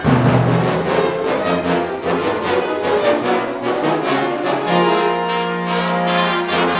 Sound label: FANFA; Roland - LA Composer vol. 2; FloodSet